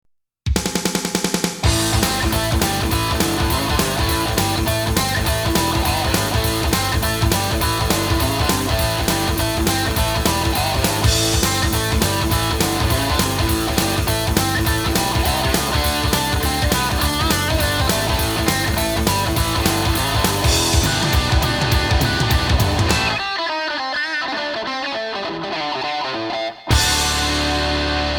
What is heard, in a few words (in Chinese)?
激情的背景音乐